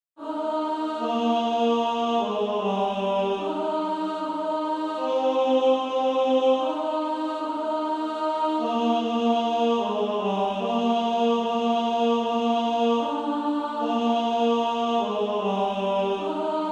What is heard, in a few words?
Female Choir; He Leadeth Me